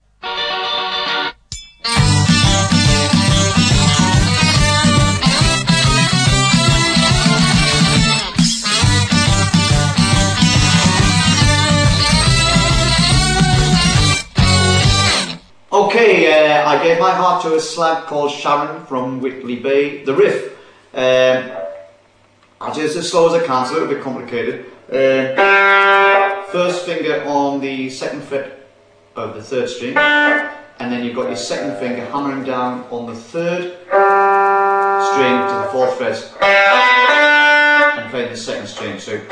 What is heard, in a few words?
Play Guitar